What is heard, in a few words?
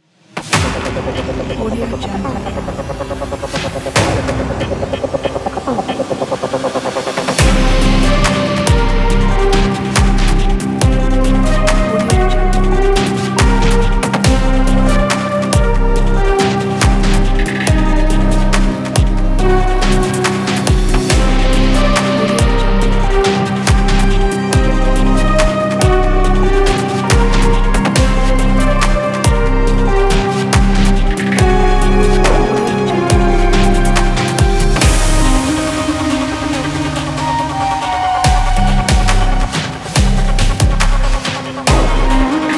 suspense cinematic hybrid orchestral intro